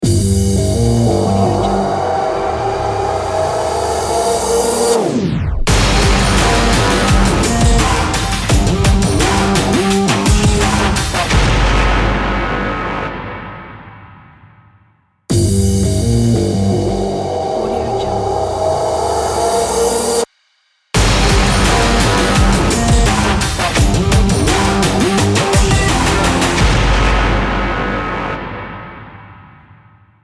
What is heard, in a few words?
hybrid intro